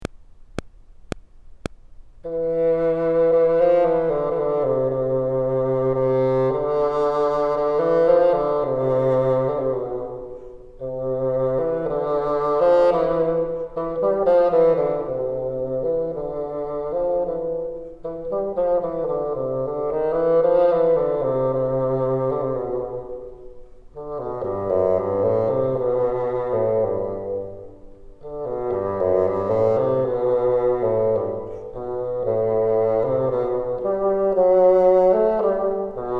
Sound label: bottom, bassoon; Bottom Part